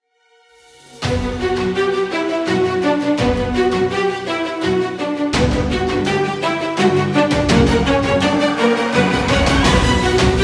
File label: Intense; Shadow Legend VR